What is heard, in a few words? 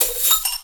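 slinky hybrid, percussive; Rarefaction; Poke in The Ear With A Sharp Stick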